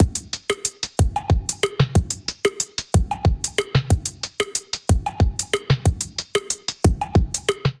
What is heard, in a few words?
Brand New Beats Kit 123 bpm, Drums, Basic; MIDI Clips; Drive and Glow; Ableton; Factory Packs